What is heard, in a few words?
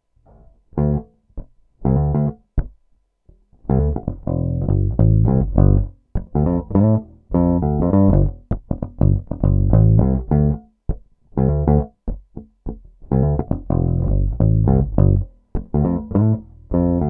Neck Pick Tone DOWN; peavey shootout audio samples